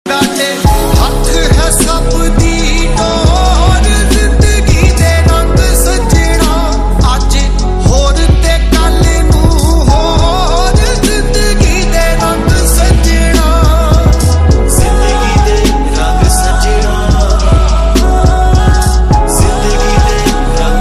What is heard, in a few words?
punjabi sad song ringtone; bollywood